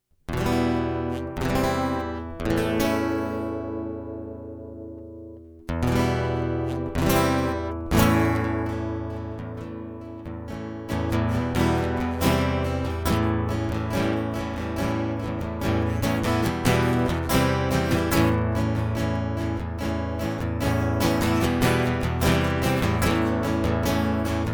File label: Guitar Loop